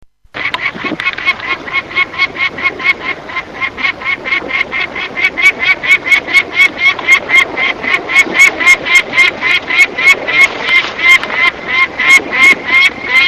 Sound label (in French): alarme